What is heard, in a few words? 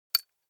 object metal small impact soft high hollow; smartsoundfx